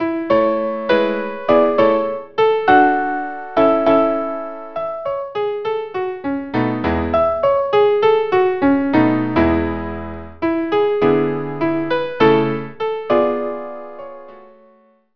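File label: violin piano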